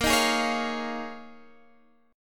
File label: D sharp 7th Suspended 2nd Suspended 4th A sharp, Guitar